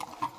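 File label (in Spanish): Pasos Caballo, horse footsteps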